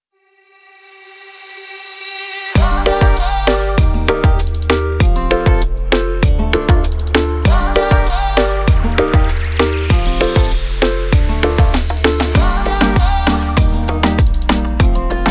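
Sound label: ispanyol latin reggaeton; By Burak Sound Bank; latin ispanyol tema vol 1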